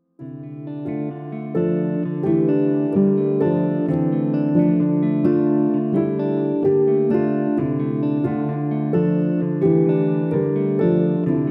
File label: Instrumental Biolateral